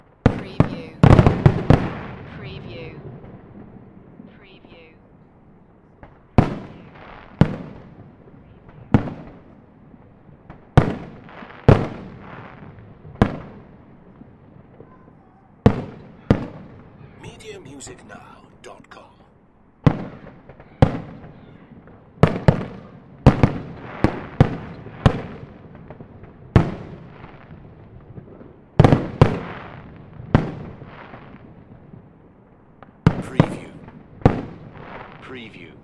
Fireworks display finale; Firework sound effects: firecrackers, bangers, bonfire crackling and other firework explos

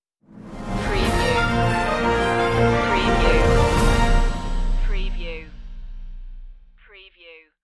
news stinger

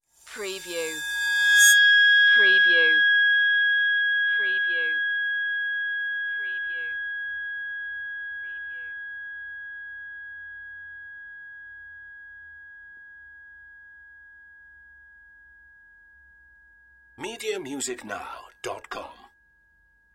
singing bowl swells

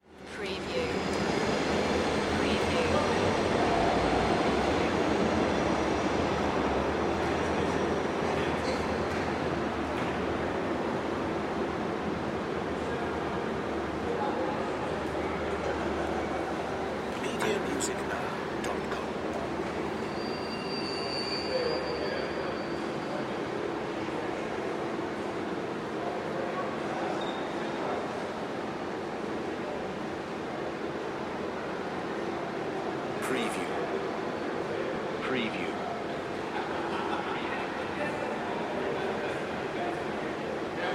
Train Station Denmark; sound effects bible

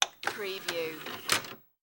CD-Rom Disc Tray Close; Computer

